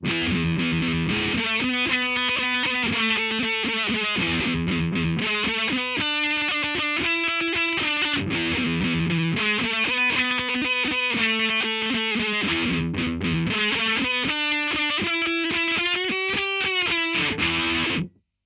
disto auto wah

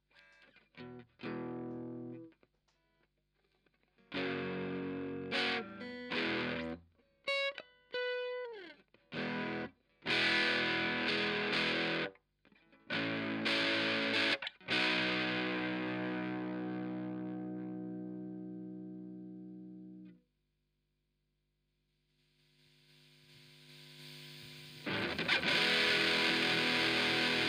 simple distortion

